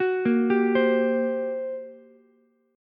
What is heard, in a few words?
G Minor Major 11th G, Ukulele, C6; arpeggiated; 11,10,15,15